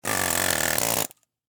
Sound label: Industry Air Hammer Operate Impact Cinder Block Short; Hammers